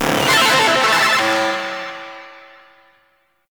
Arcade Fail; Warframe; Nineteeen Ninety Nine; Snake Game

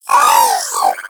Zanuka Companion Vocal Pain; Pets; Warframe

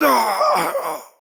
Death, Corpus Male; Warframe; Modular Corpus; Battle Barks; en

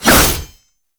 Shield And Sword Whoosh; Warframe; Grineer; Mortifor

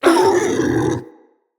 Corrupted Pain, Animals; Bromius

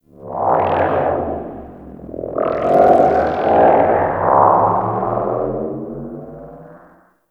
Exterior Small Ship Passby; Ambience; Warframe; Corpus Railjack

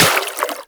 Water Sprayer Beam Impact Flesh; Warframe; Weapons

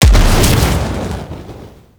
Rocket Launcher Explosion; Warframe; Weapons

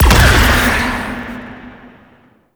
Ship Cannon Fire, Flak Cannon; Warframe; Railjack; RailJack Weapons; Ghost Ship

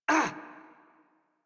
Generic Short Pain Operator Voice en; Warframe; Operator Mission

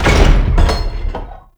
Big Door Opened

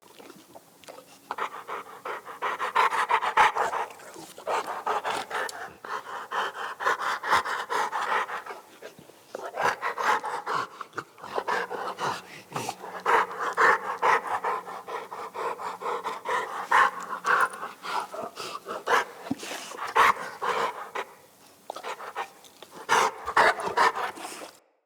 Pack Wolf Run Breathing Loop; Animals

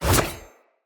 Sword Whoosh Heavy; Fey Sword

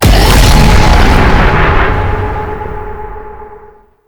Octopede Portal Spawn; Warframe; Duviri Murmur; Octopede Encounter

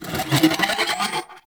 Arch Gun Reload; Thano Tech

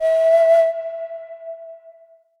Default Flute Alt E; Player Instrument

